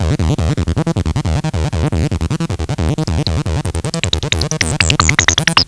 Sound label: bs acid, x0xb0x